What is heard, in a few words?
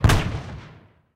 apache bullet hit layer; War Thunder